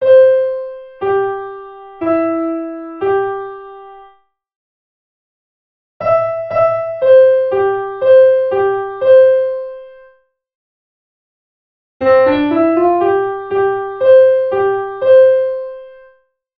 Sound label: Simple Phrases